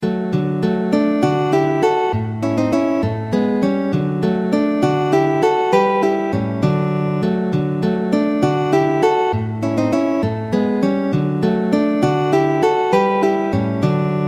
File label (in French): valses; legnani luigi